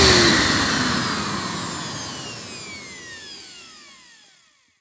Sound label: motor down; Sonic Foundry (Sony Creative Software); Ugly Remnants VOL-2; Beatstuff